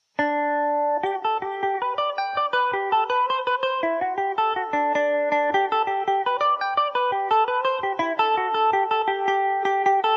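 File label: G major, Irish mandolin; Dunlop 60 plectrum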